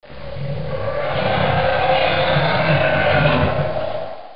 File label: Ghost